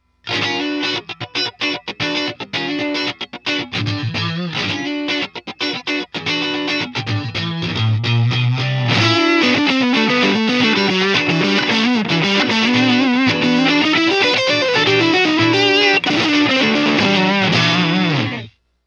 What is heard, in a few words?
ROCK FANK